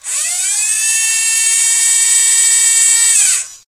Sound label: sentry move long; tf